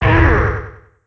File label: thwomp